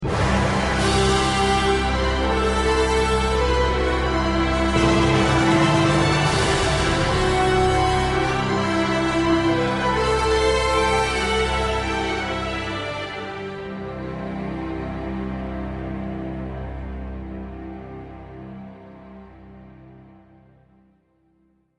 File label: sting win mission; gameplay music; stings; SWR chopper chase